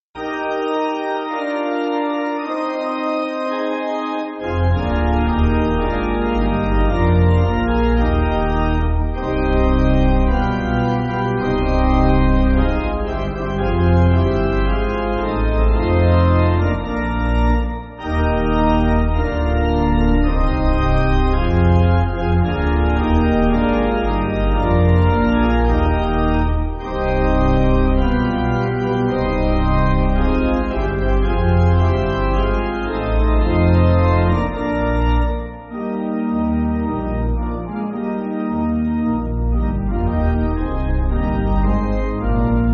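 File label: Slower Pipe, Pipe Organ; Gounod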